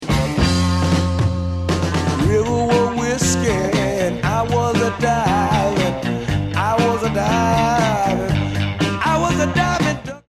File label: duck blues